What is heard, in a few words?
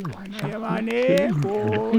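Music Village Calm Inside; from dust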